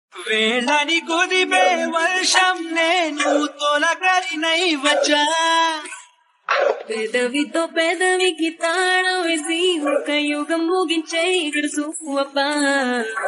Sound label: Song Telugu Ringtone